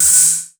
SOUND EFFECT, Metal; AKAI S6000 CD-ROM - Volume 1

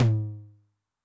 Noise High, 909 LOW TOM

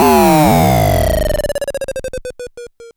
Klone Dual Percussion Synthesiser FX

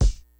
GREASY KIT, DRUMS; Kid Nepro; Elite

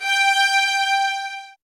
G, soft violins; Q Up Arts; Denny Jaeger Master Violin Library

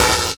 SD, Drum & Bass, 160 BPM; Ueberschall; Drum & Bass Resonance

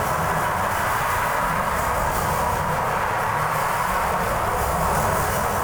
Tonal Re Draw, sparse soundscape

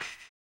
HAMBONE; Blues & Soul

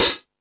High Hat, Drum Machines

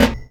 Subtle Snare; Sonic Foundry (Sony Creative Software); Esoterik Beatz; Subtle Kit

